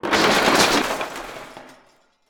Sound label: wood crate break